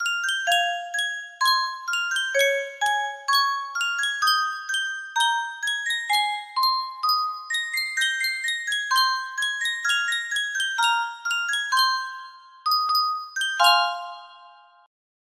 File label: sankyo music box, music box melody